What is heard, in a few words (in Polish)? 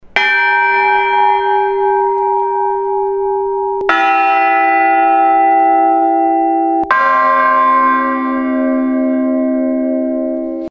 dzwony